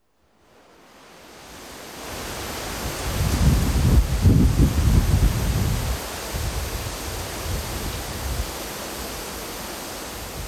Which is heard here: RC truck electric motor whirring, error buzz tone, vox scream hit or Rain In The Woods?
Rain In The Woods